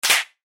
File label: Chime Percussion; Multimedia Web Interactive; Blastwave; Technology